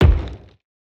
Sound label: thud; cross-code